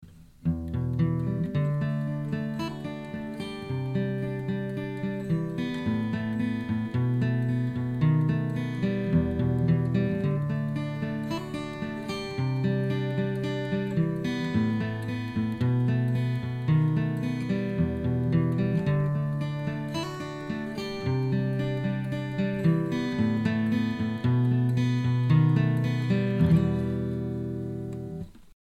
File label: Acoustic, guitar